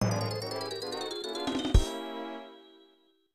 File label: Lose Life; Boogerman; SNES